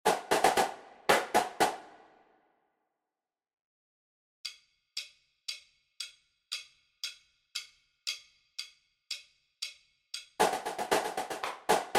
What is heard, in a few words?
cadence snare